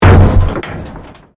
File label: Convolution Pulse